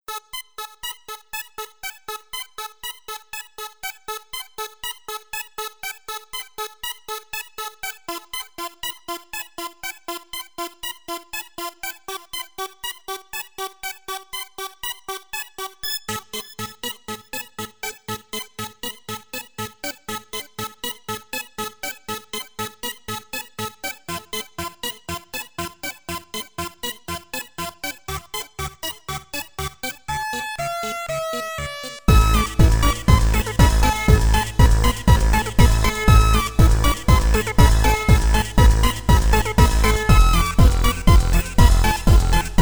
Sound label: energetic flow warm up 432 hz; Rozgrzewka